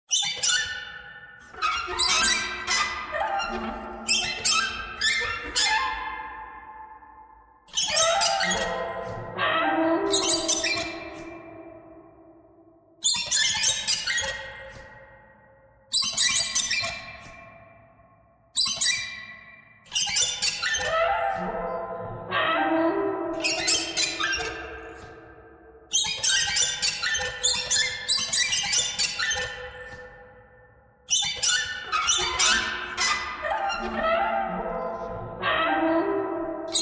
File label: birds birds thread harmonic